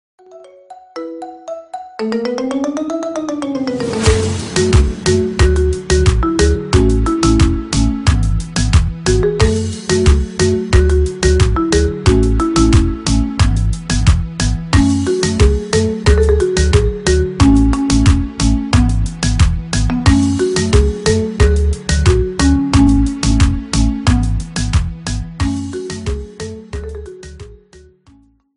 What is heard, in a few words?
Marimba Ringtone Remix